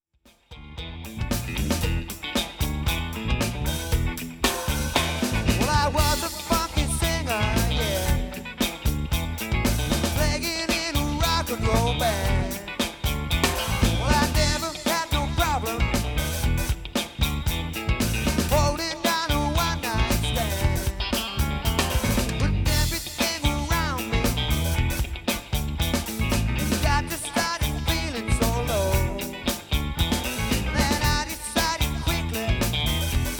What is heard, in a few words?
Funky